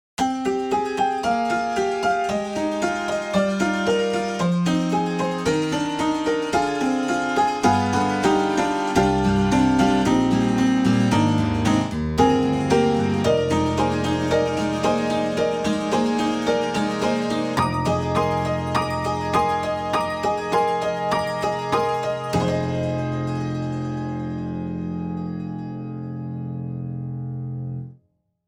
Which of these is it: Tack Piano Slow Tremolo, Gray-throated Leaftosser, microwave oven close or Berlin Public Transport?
Tack Piano Slow Tremolo